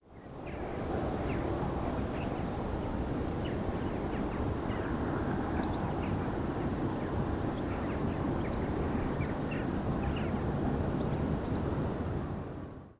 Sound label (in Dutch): Dwerggors